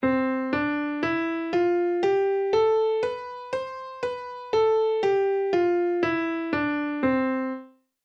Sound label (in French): ionien; comment identifier un mode a oreille